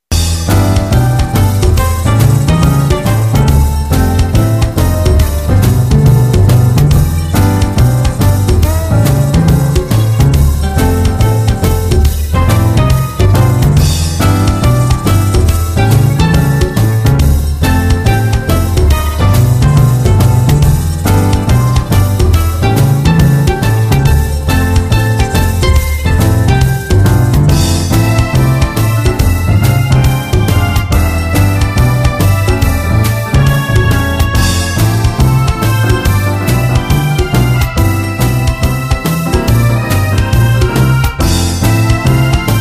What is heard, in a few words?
jazz; 게임음악-재즈